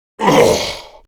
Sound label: Death Grineer Male; Warframe; Battle Barks